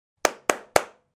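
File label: hand clap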